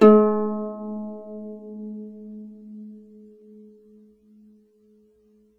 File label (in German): HAKENHARFE; SoundBytes; Early Patches